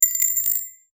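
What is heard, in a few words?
dense leather pouch, cash-register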